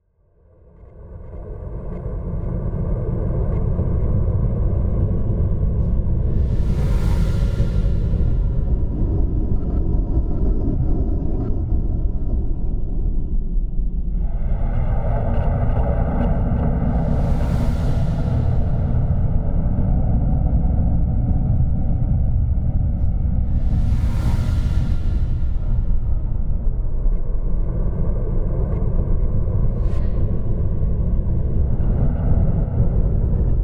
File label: mining on mars; Leslie Reid - STRANGE WORLDS PART 1